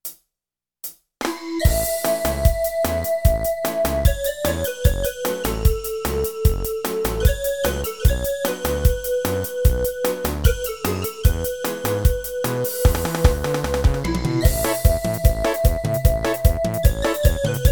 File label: Cumbia